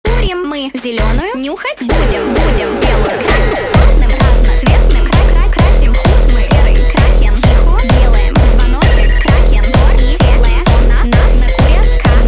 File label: Hardstyle